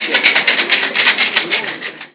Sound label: Shaker; ゆめ2っき